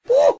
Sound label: gnome woo